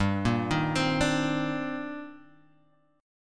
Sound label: C Minor 9th G down Guitar Standard; Cm9/G Chord {3 1 1 5 3 X} arpeggiated